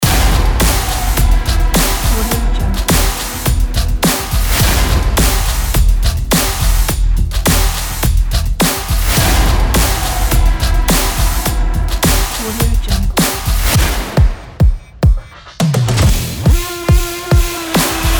trailer drums synth loop drive; lunamycreations

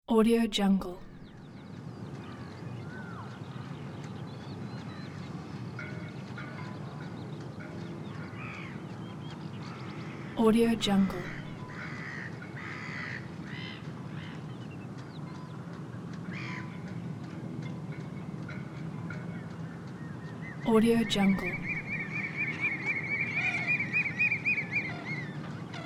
harbour; vinyl sounds

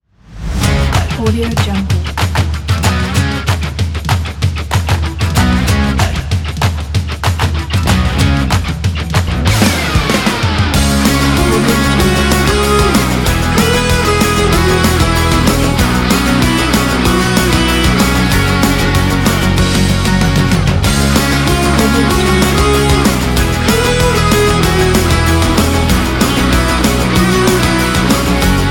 uplifting upbeat happy rock

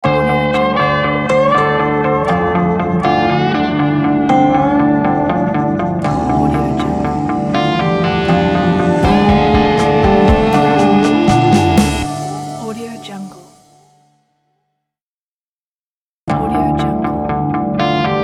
blues logo

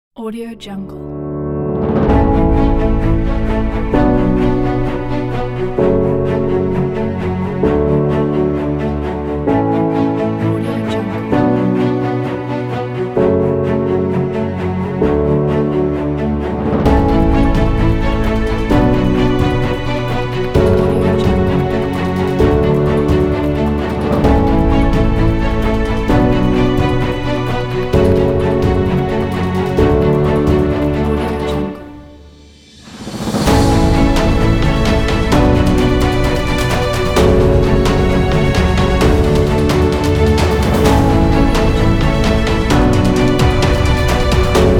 epic trailer

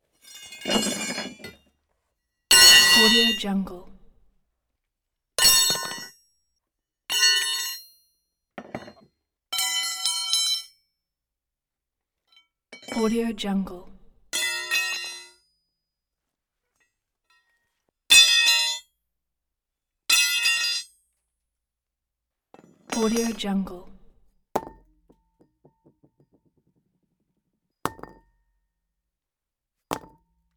metal drops object hits crowbars pipes high end; prosoundfx

